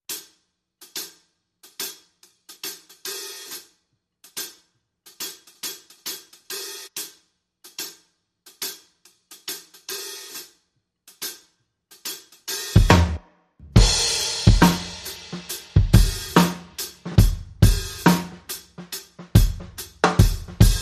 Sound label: Groove, Drums and Perc Only